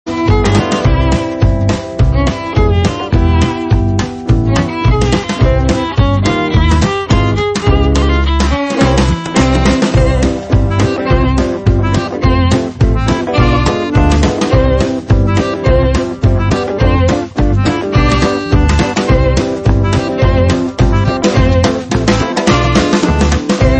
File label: Ringtone (Xmas Jazz Combo)